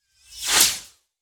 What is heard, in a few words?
energy cloud whooshing by very fast